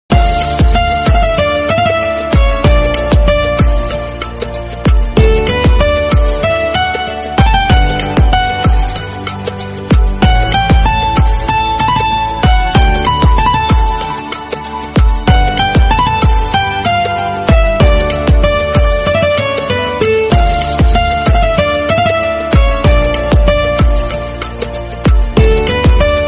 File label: benjo instrumental, ringtone; sare jaha se achha